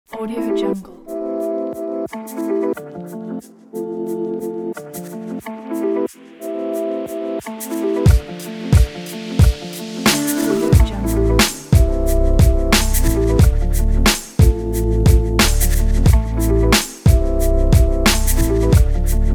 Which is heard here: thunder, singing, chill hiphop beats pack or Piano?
chill hiphop beats pack